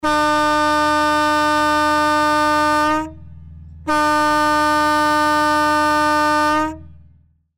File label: fire fighting boat horn